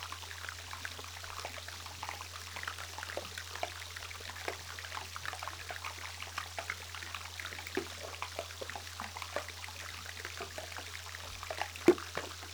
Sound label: Hydrophone Front Glacier Petillement